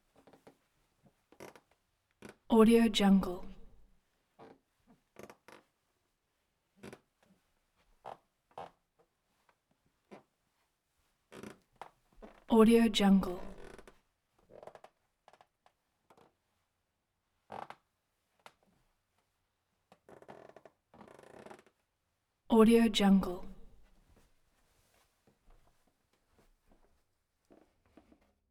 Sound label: carpet on wood floor creaks